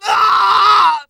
pain severe; Scout

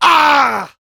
pain severe

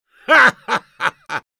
laugh happy, es; Engineer

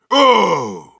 meleeing, ru; Heavy

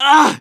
pain sharp; Medic

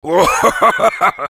laugh evil; Heavy; fr

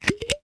grenade open; Taunt; demo; nuke

